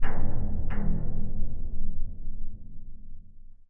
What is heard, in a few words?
Bridge stress